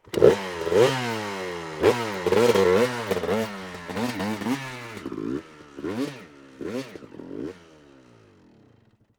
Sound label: motorcycle